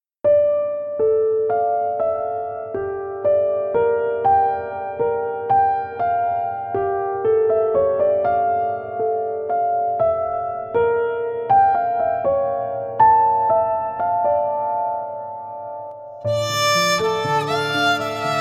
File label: Sad BGM